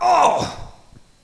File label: pain; Alien Arena; infantry